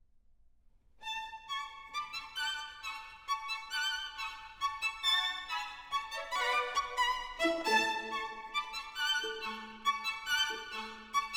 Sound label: Violin Concerto in A Minor, Finale Allegro giocoso ma non troppo